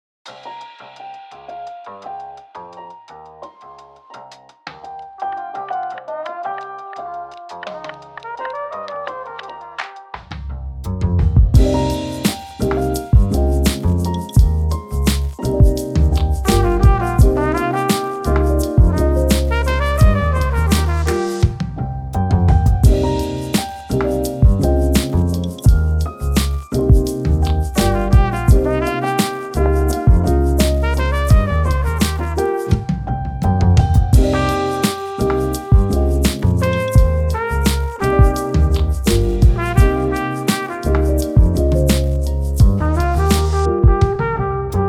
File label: lofi